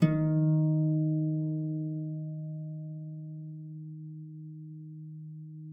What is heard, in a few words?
D3, HARP; Xsample VOL-8; HARP P+MF